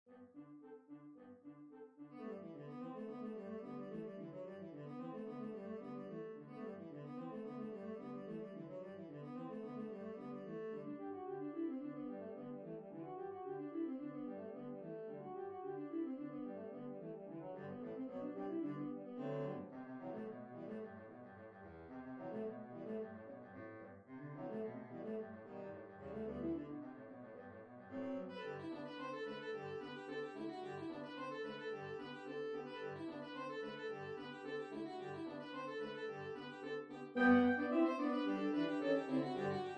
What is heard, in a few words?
Sax Quartet; Grainger; Molly On The Shore